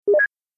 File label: modtools new ticket; nitro